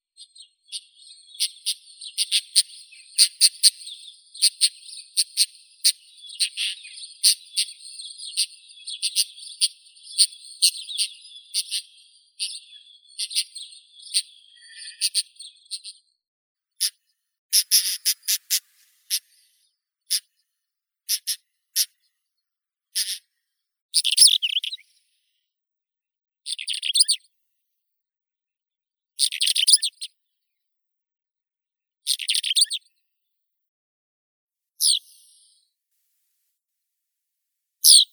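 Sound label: pied wheatear, Oenanthe pleschanka; kamenka pleshanka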